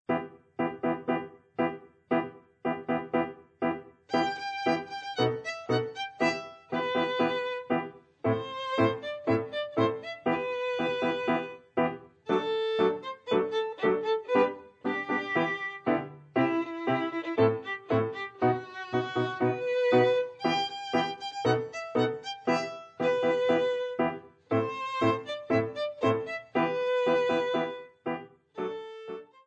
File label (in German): tango